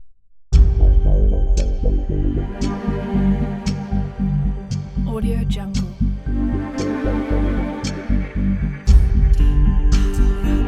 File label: cinematic suspenseful countdown